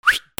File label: WHISTLES